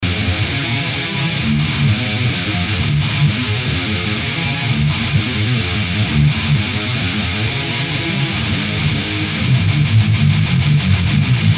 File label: GTR